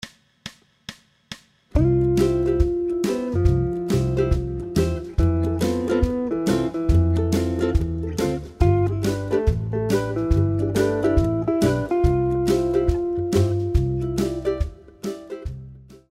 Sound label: all bad notes